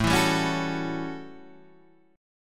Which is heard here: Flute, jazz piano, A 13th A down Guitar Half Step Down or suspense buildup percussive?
A 13th A down Guitar Half Step Down